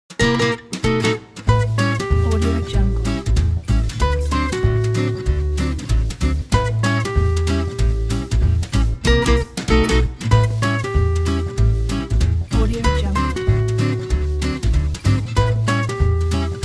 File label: playful gipsy swing